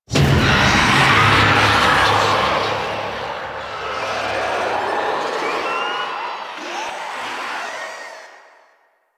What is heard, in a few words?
Zoo Wave Start Stinger; Warframe; NineteenNinetyNine; Stage10TheZoo